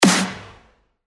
Snare; Pedigree; revealed claps snares vol 2

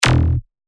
E, bass shots; Damage; revealed bass shots vol 4

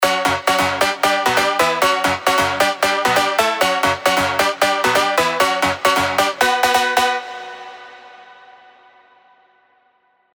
Battlecry, rave stabs; Spire; revealed

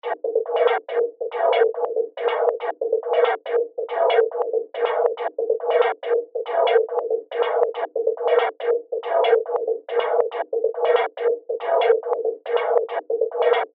FX Loop 140 BPM; Spandex; revealed fx loops vol 2

